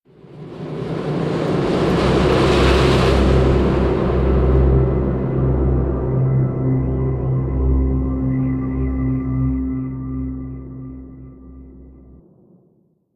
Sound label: Mission Music Mystery Stinger; starcraft 2 wings of liberty